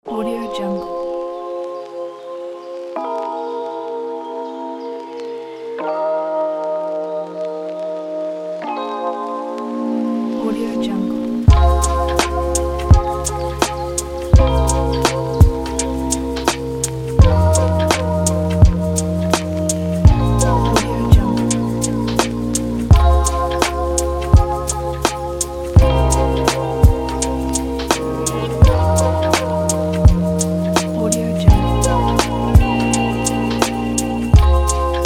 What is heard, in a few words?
be lo fi